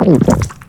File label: vamp